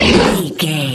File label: troll monster hurt pain